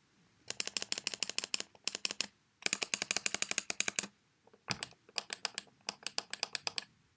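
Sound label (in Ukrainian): Звичайна мишка лівий клік правий клік коліщатко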